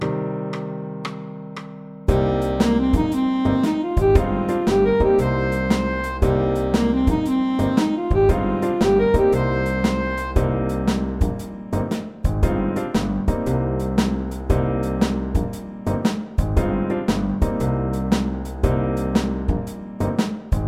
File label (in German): Pentatonik Moll Aufsteigend Shuffle; Begleitung im Shuffle; Registerausgleich